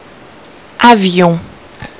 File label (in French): avion